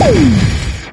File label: hero hoverboard crash; subway